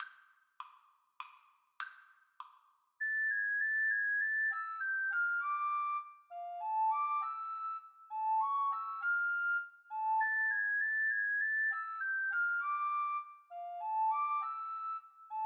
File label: fur elise, Recorder Duet